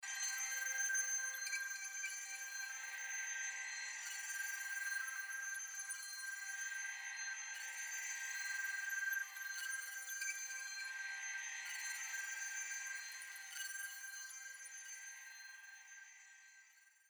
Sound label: Pad Reverb On; Mix Insert; Reverb Delay